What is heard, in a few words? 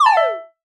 powerup off